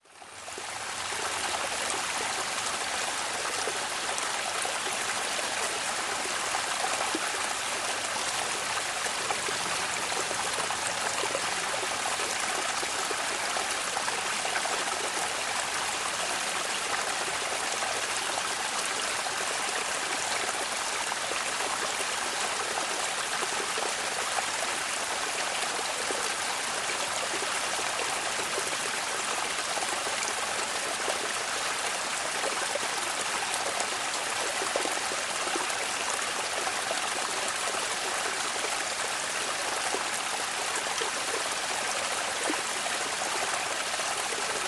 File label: summer mountains stream medium altitude close perspective; sonniss gdc